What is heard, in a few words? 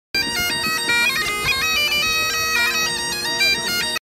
Bagpipes; ساز بادی اسکاتلندی